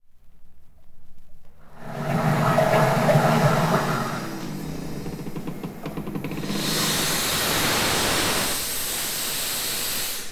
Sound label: single cylinder steam engine